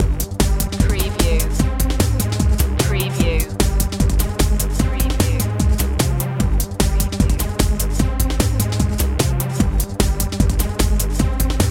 trance race

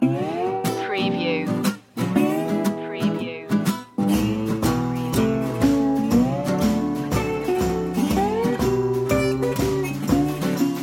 blues

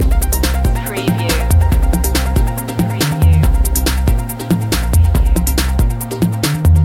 electric dreams loop

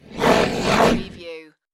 dog monster growl

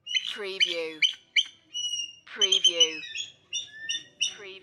Two eagles squawking and barking excitedly

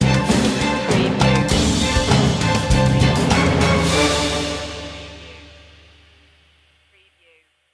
spooky stinger; media music now

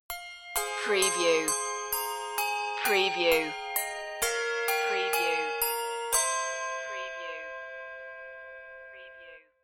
handbells; god rest ye merry gentlemen

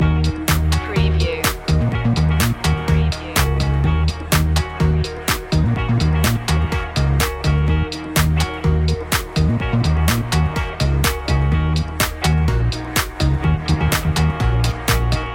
fashion addict loop; Melodic electronica music and synthesizer sounds containing flowing fresh melodies.

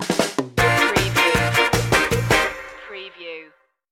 ska town shimmy stinger

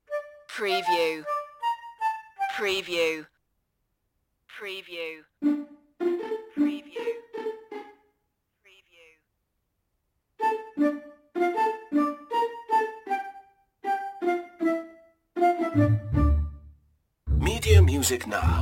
no percussion

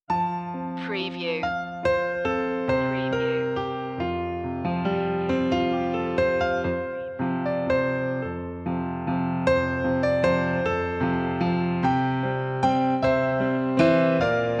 silent night piano; media music now

